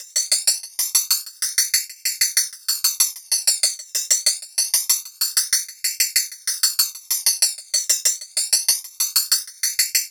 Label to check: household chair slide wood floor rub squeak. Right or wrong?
Wrong